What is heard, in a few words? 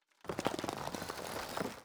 reel spin start; netent